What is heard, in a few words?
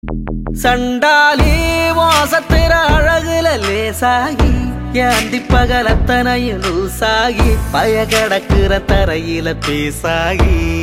Hardcore reed